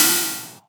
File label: Ride; Vengeance Samples; VEE Cymbals